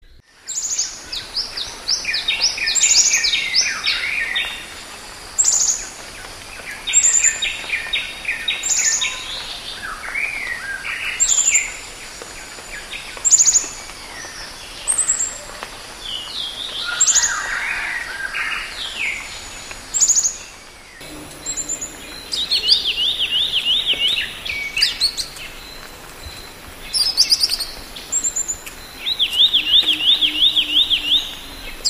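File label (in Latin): periparus amabilis, Sikory; sikora kapturowa; ptaki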